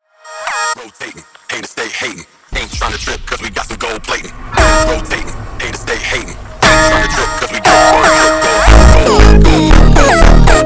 slowed, funk

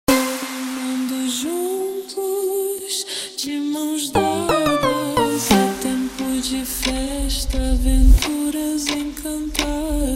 super Slowed; فانک

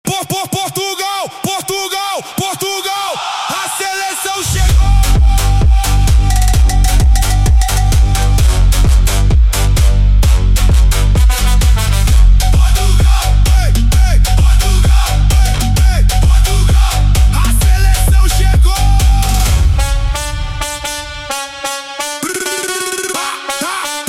Edm

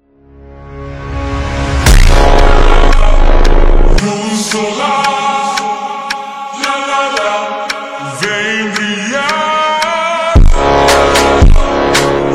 Funk, Ultra Slowed

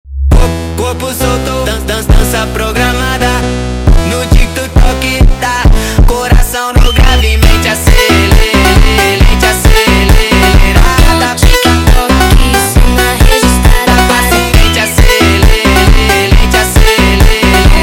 sped Up

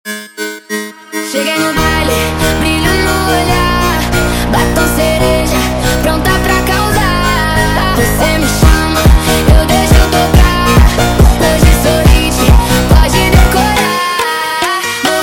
sped Up, funk

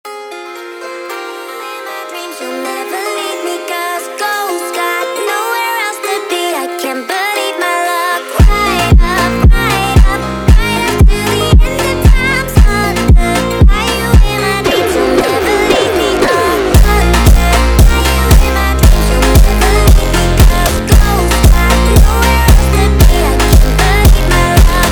Jumpstyle, funk

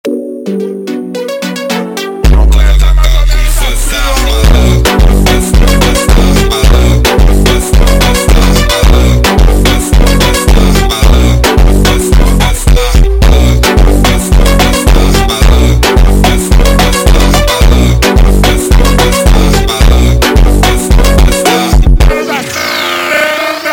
Funk, Slowed

